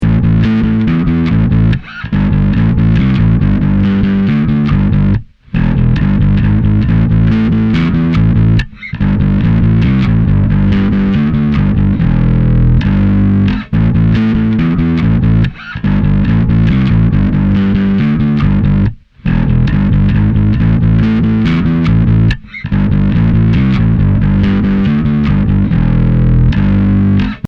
klangbeispiel 2 finger

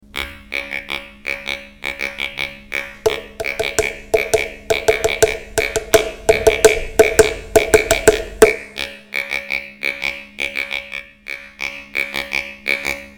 Liveeffekt Resonator FX; Zooms

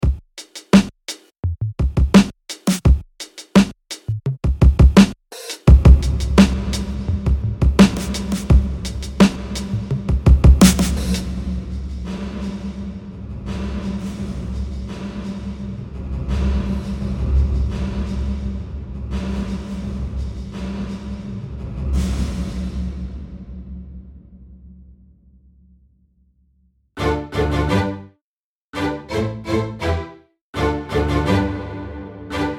Hall

